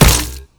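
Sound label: crossbow victim impact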